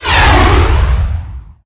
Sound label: Shoot Comet